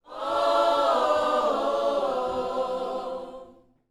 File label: YOUTH CH PHRASE, Vocal Planet Gospel; Spectrasonics; Vocal Planet Vol.1 Gospel